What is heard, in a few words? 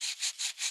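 Special Photo Crayon Environment Draw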